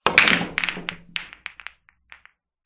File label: billiards pool break; silverplatter audio